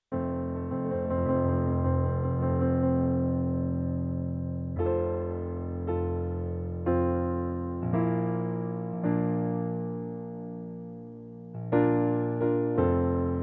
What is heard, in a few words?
happy birthday in blues